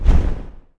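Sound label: fell beast flap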